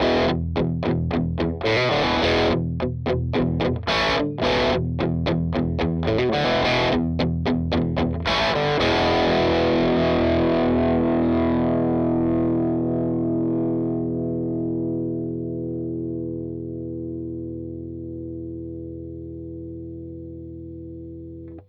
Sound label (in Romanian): Distors Cablu Obisnuit; Line 6 Relay; wireless pentru chitara de la Line 6